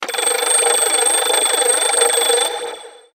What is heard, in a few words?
message tone